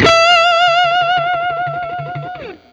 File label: BLU TRILL, Guitar & Bass; 90 s Sample CDs; Quantum Leap - Guitar & Bass